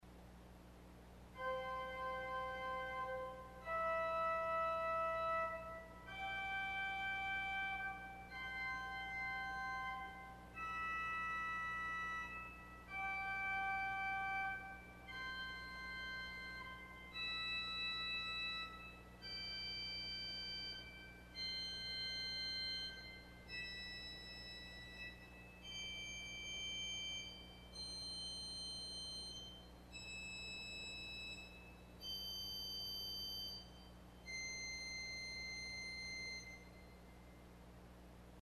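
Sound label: Plein Jeu Arpeggio, organ stop; CulverAcademy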